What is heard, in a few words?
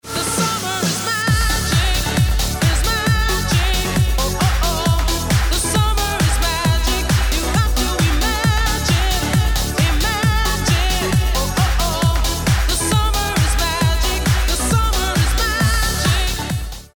BEAT